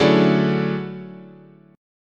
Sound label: D Major Sharp 11th, guitar chord